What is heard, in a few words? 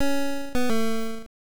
Bet Lose; Dian Shi Ma Li; Dendy